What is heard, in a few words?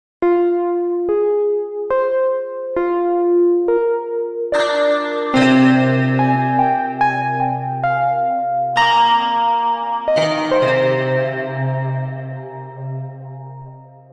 piano klink, phonetones; Sony Ericsson; PlusFourSix; Thomas Tibert